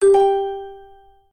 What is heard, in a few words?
collect freeround end; Hot Fruits 20; slot game